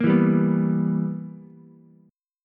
B Major 7th Suspended 4th Sharp 5th Ukulele, chord; BM7sus4#5; strummed; 3,4,3,2